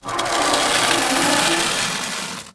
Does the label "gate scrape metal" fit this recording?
Yes